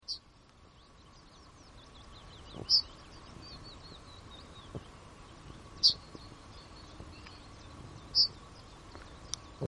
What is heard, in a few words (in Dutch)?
Geelgors Emberiza citrinella Geluid; Annelies van Klinken